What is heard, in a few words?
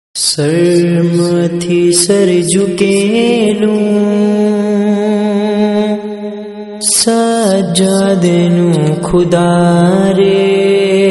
Guj Noha; Sajjad as